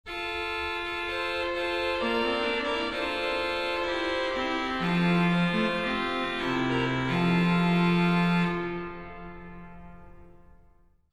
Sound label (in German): trompete